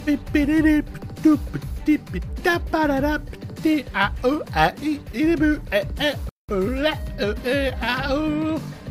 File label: singing